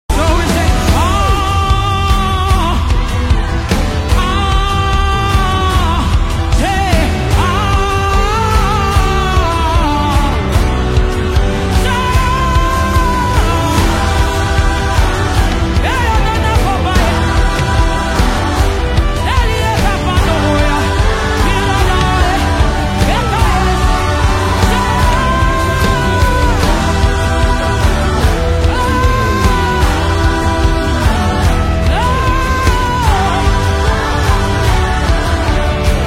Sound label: Chant Ah Ah Ah